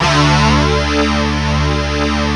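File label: distort, Fairlight; East West; Global Sounds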